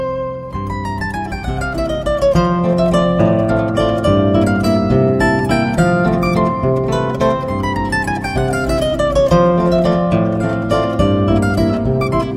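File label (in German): Böhmische Musikanten, zither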